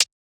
hat, Closed Hat; Hip-Hop; Drum One Shots; bop; SD CAICOS Hip-Hop Sample Pack; Slate

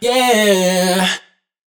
riff Oh Yeah Long B wet, Vocal; Slate Digital Omnivox Vocal Sample Pack

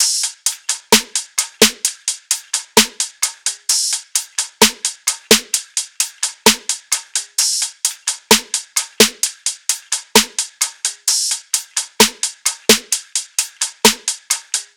tops 130, Drum Sample Pack Loops Top Loops; SD SEISMIC; Slate

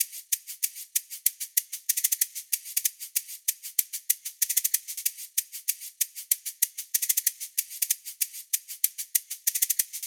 hat loop 95, Drums; Pop; Hat Loops; brightside; Slate; SD PASADENA Pop Sample Pack

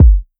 Kick Dark Heavy, 808 Kicks; Slate; Vintage Drum Machines Volume 2 808 Edition

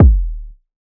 kick tail; Drum Sample Pack; One Shots; Slate; SEISMIC

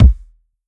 Kick, 909; Slate; Vintage Drum Machines Volume 3 909 Edition

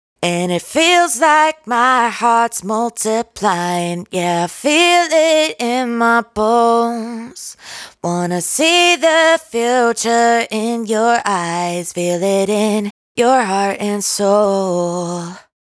hook, 123, F, Vocal, Dry Vocals; Raw Vocals; Feels Like; Slate Digital Omnivox Vocal Sample Pack

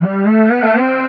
vocal erupt G; SD AURORA Sample Pack; Slate

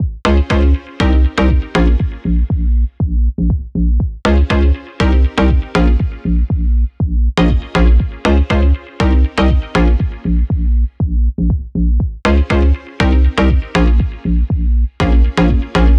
Pop songstarter 120 Ab; SD PASADENA Pop Sample Pack; Slate

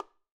bongos Single Hit Mute; raw perc one shots; SEISMIC Drum Sample Pack; Slate

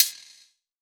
perc boing; Drum One Shots; SD MIDNIGHT Sample Pack; Slate

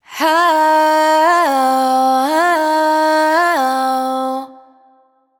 riff How Fm wet, Vocal; Slate Digital Omnivox Vocal Sample Pack

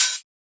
hat quickie, Open Hat, Drum One Shots; SD MIDNIGHT Sample Pack; Slate

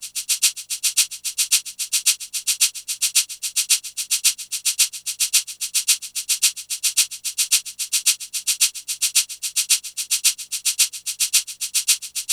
hat 110, Drum Loops, Hat Loops; SD MIDNIGHT Sample Pack; Slate

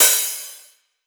Cymbal, 909; Slate; Vintage Drum Machines Volume 3 909 Edition

